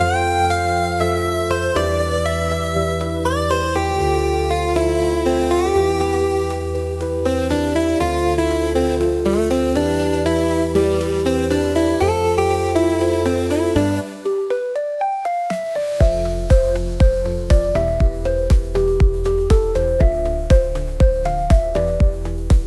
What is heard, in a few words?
tune, chiptune